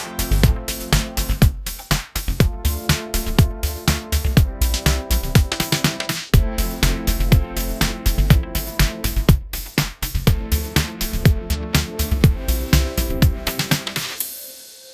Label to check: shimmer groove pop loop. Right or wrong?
Right